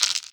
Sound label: dice shake